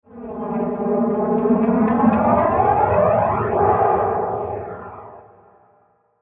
Science Fiction UFO Transition With Extrem Left To Right Panning